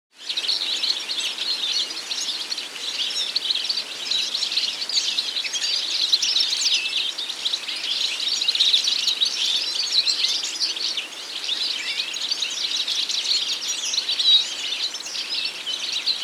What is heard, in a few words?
Goldfinch